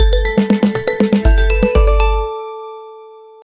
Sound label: loopable epic, slot game; 4 second